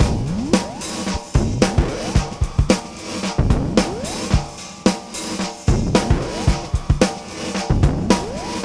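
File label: fx, Beat Loops, 111 bpm; Zero-G - Monster Beats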